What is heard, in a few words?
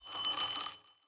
success